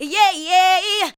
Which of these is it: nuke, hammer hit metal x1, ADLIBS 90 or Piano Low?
ADLIBS 90